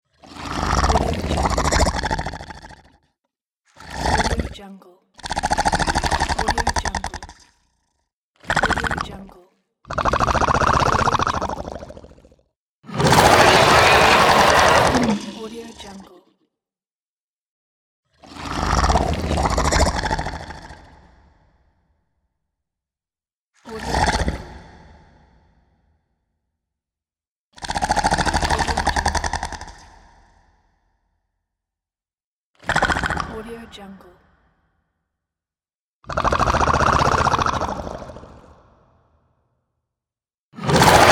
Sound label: alien; absinthemedia